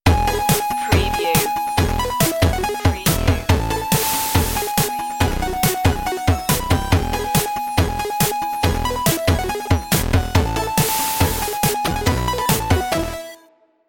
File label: 8bit jingle bells